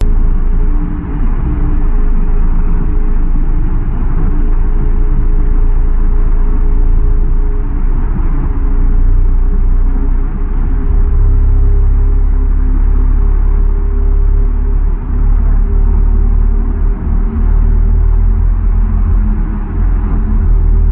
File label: Designed Soundscape LOOP; PMSFX